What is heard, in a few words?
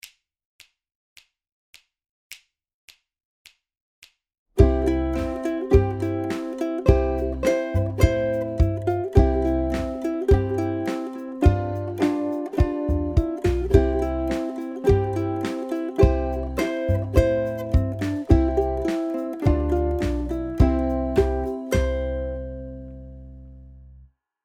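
BACKING, ukulele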